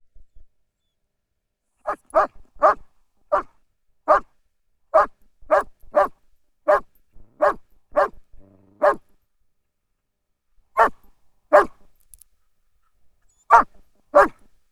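Dog German Shepherd Exterior Field Bark Many Times Start and Stop; DPA4060; Pole Position; Barking Dog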